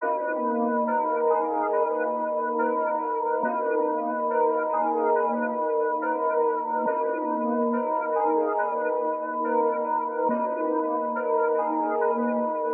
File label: BEAT